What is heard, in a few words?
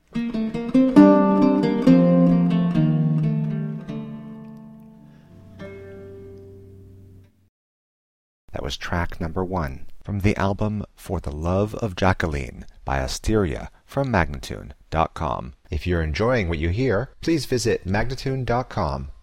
Lute intro; Antoine Busnoys